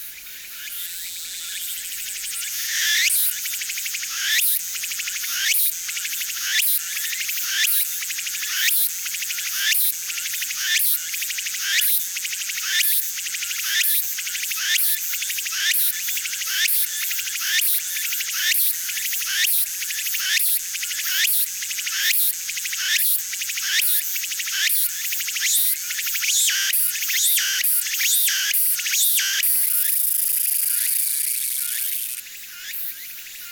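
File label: Meimuna opalifera cicada; tsukutsukuboushi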